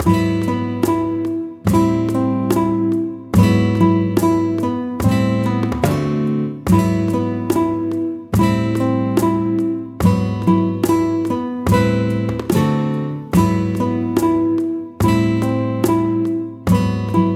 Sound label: BGM A Storm Approaches